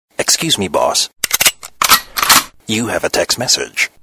Sms Tone

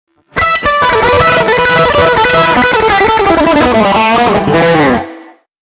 riff

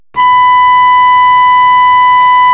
carrier; combates

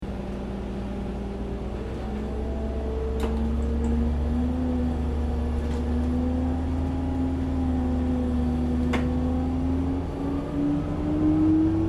bus

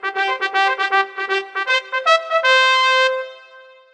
bugle charge

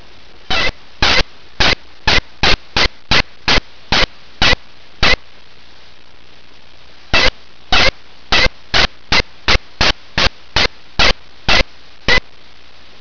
lost call, turkey sounds

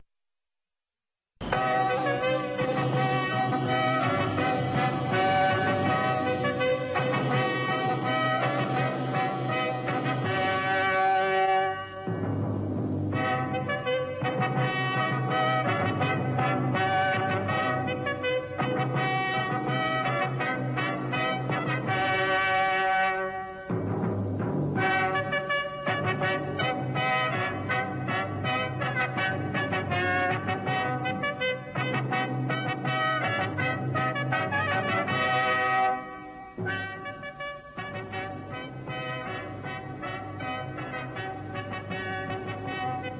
timpani

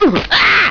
hit face

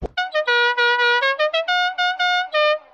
Dixie Horn

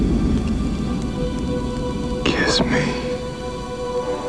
kiss

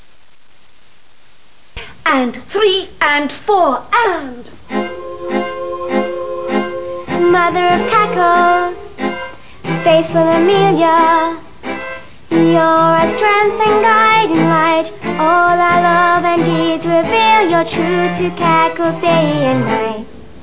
sings

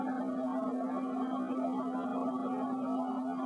mans deep laugh

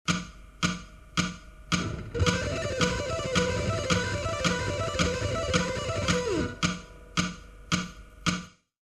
alpick ex fast

